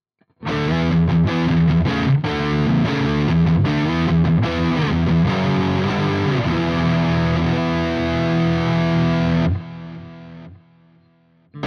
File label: Lead Muted Riff